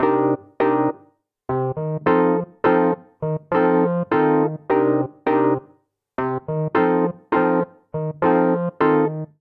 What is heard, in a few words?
Vamp Funk Latin C Minor - F7 102 bpm, Electric Keyboards; Ableton; Factory Packs